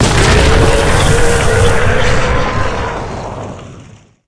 Eagle Volcano Erupt; Angry Birds

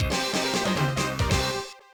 Fanfare; Ultra Street Fighter II The Final Challengers

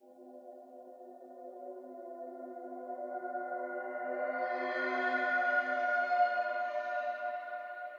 Npc Combat Metal Pad

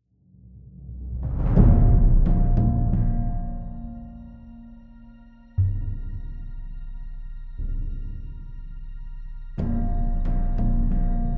Loop Tense

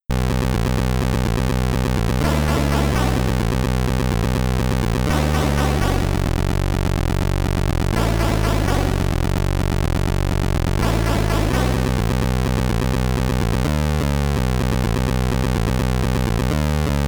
Boss SID Stereo; Gemini Wing; Commodore 64

